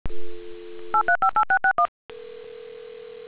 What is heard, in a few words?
dialing